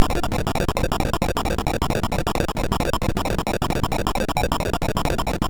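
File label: Mountain King, MOS 6581 SID chip music, games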